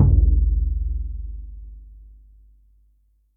Big Boy, Electric Kick, Yamaha DTXpress; drum machine

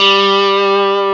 GTR Tube, Dirty; Club-50 - Foundations Roland

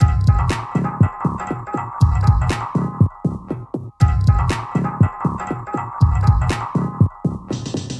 Deep Beat, Composite Beats; Sonic Foundry (Sony Creative Software); Myths of Technology

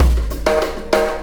Alien Influence Beat, Processed Drumkits

